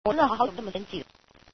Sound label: angry